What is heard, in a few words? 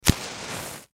Match Strike, Fire Strike Flare; Flames; Objects; Flame Sound Effects